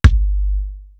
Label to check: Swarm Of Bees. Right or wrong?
Wrong